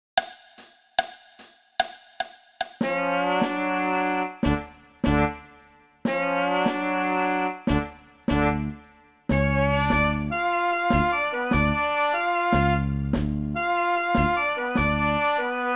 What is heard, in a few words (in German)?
Playalong alle Instrumente